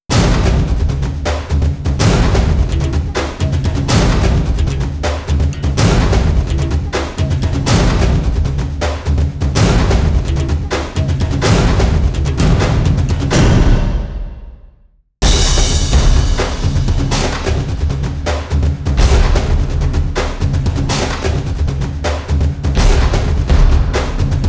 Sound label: Prepare To Fight, trailers tension menace; Anger Music Library